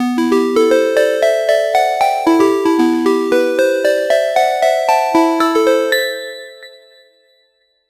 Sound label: a short cheerful retro ga, retro game